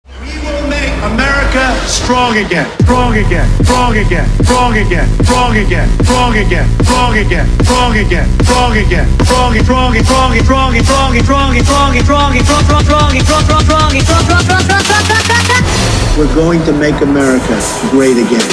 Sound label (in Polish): muzyka